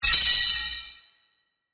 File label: collect